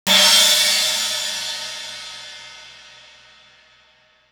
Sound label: Cymbal; 90 sSampleCDs; Coldcut's Kleptomania VOL1; Drum One Shots